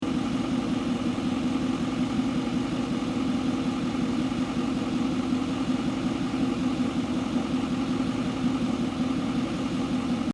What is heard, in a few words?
ford idle